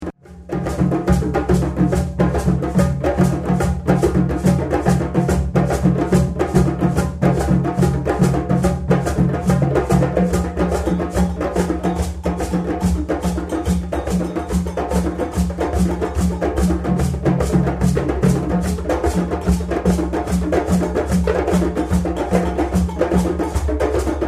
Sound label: iba, drums